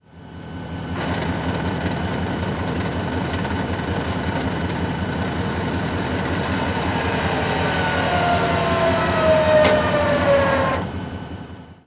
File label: Brake SafeSet Set; RailPro